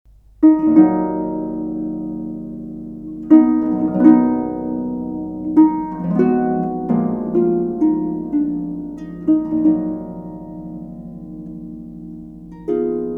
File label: Etude de Concert; F Godefroid